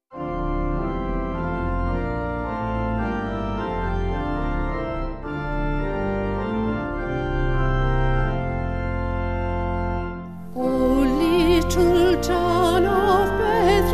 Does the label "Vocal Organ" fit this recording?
Yes